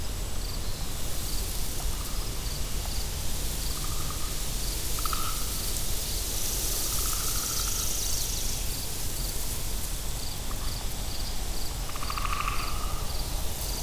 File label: ambient soundscapes; dota